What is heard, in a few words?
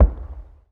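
explosion anti-aircraft gun sky burst distant; Explosions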